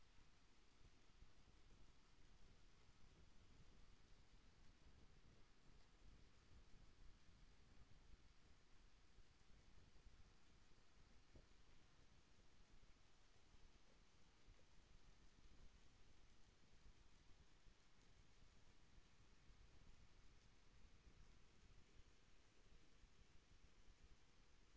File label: HYDROPHONE 16000Hz; JASCO AMAR HYDROPHONE 740